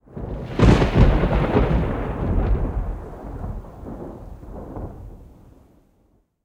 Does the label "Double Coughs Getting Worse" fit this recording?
No